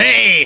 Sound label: grunt; Krunch